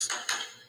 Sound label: tap